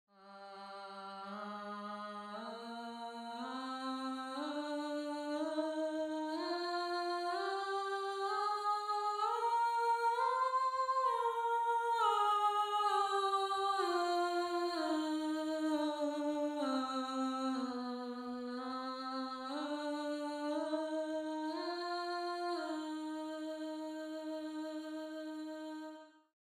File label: Alto